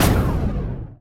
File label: plasma boom; Mindustry